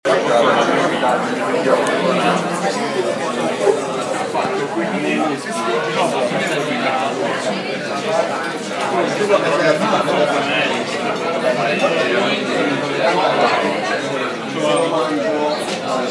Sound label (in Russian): ресторан; Милан